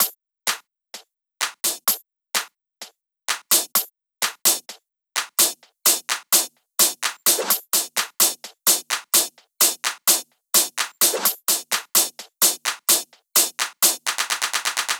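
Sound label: Drum Loop 128BPM hi-hat Loop; VEC5 Drumloops; VEC5 Multi Loops 128BPM; Loop Elements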